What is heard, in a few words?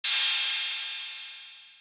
Drum, Drum One Shots; AMG - Coldcut's Kleptomania VOL-1